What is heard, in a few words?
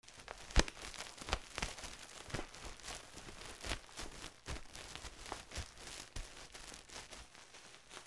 FRICTION